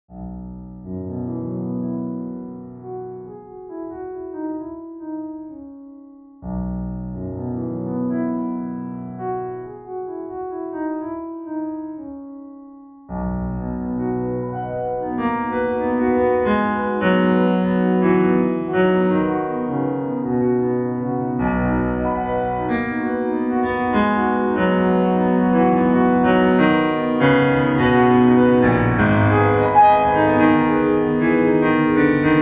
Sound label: PRELUDE B MAJ